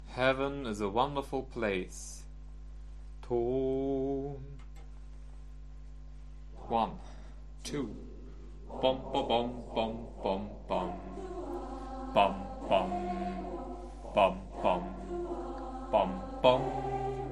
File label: Tenor